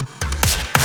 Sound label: Loops Fills; Vengeance Samples